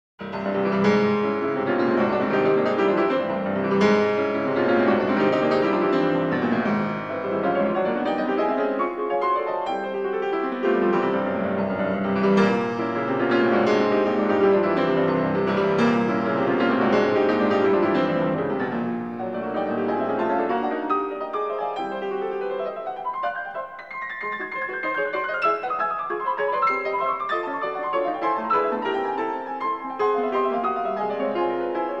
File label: Prelude for piano in C major; Rachmaninov